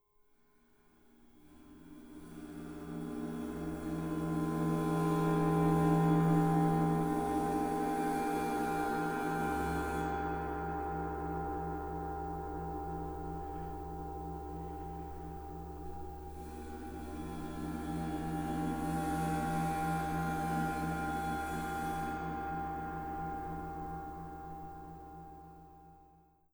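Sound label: gentle bowing; bowed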